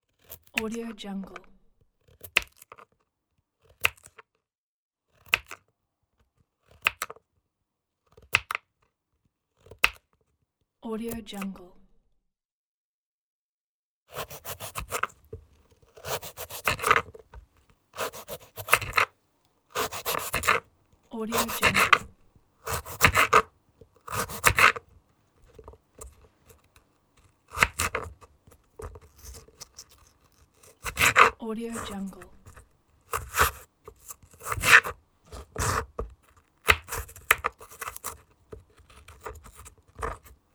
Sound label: chopping vegetables